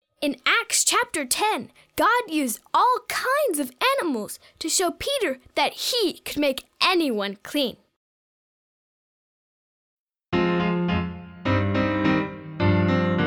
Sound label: Clean, Clean